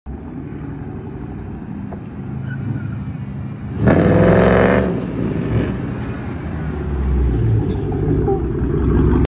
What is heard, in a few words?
chrysler